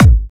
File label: natural kick sample g sharp key, kick sound tuned to the G# note